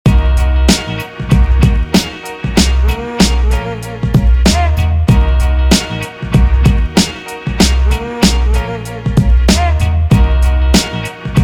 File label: scratch